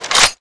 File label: laser draw, weapons